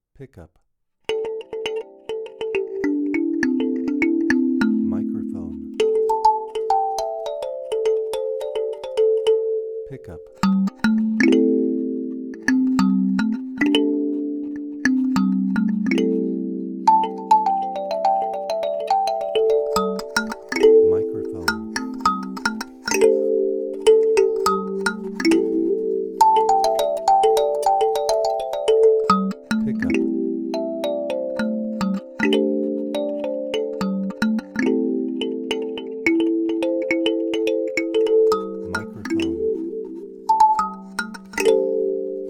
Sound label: Alto, kalimba